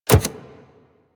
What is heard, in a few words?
Step Default, Foley Footsteps, Pets; Warframe; Zanuka; Zanuka Companion A